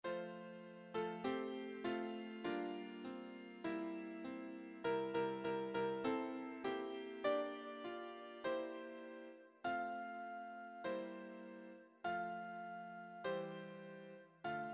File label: piano